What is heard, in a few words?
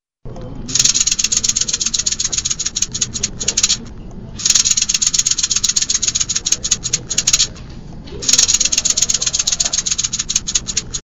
Rattlesnake